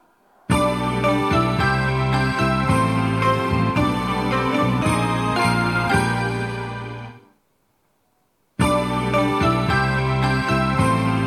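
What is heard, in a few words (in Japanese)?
発車サイン音, 音量は大きめです; 高田馬場; 2番線 中野・三鷹方面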